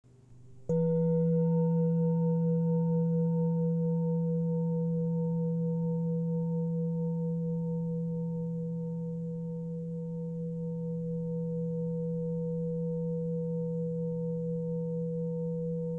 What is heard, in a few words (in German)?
erleuchter Buddha